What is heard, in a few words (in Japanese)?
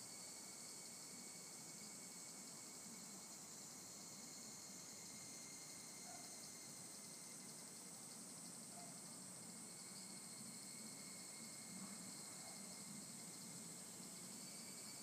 ヒグラシ